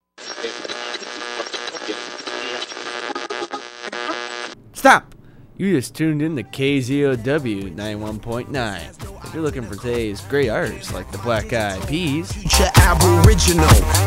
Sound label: Music liner